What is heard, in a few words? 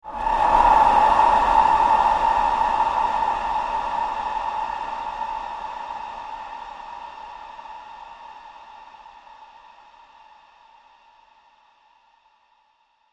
Fallout Whoosh; The End - Fallout Whoosh; Whoosh Sound Effects